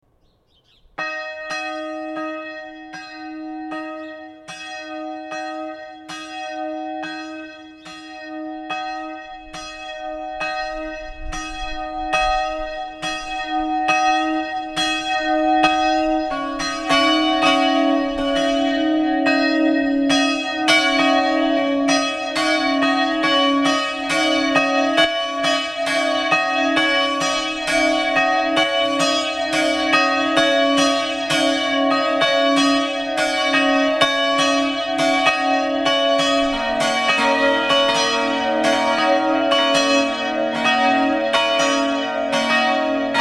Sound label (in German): glocken